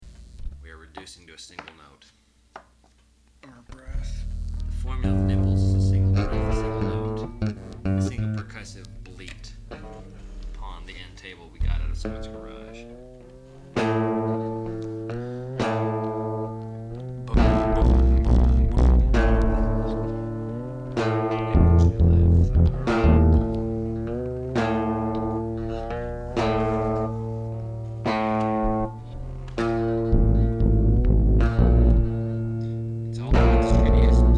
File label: The Moaning Song